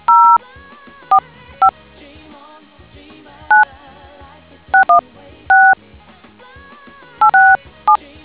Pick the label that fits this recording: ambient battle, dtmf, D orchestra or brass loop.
dtmf